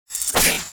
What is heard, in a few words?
attack